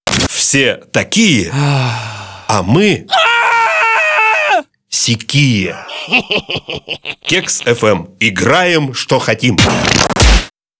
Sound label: jingle